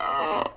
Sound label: Squeak